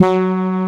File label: SYNTHESIZER, ANA HORN; AKAI S6000 CD-ROM - Volume 1